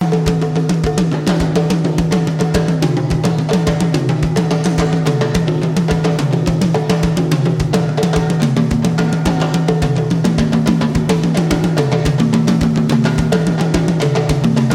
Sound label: Drums